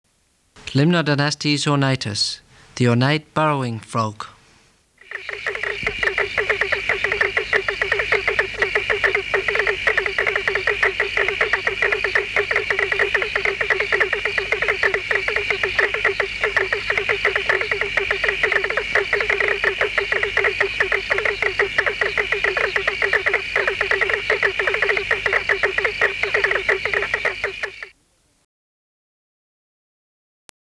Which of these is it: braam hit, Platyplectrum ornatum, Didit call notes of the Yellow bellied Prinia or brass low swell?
Platyplectrum ornatum